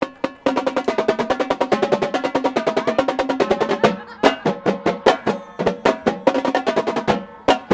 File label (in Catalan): fragment caixes